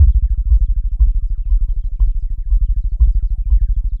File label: Anabeat Kick